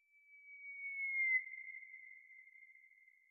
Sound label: BigLeap Whistle; Caffeind Founder